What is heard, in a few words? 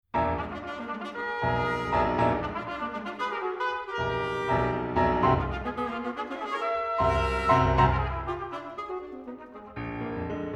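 Fanfare (trumpet, alto sax, piano)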